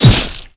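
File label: critical damage; The Elder Scrolls III - Morrowind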